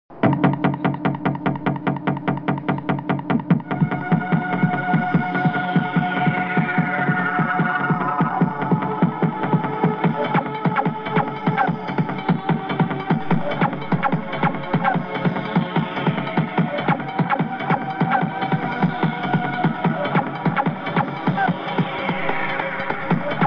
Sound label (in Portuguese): Cordas; CordasdeFogos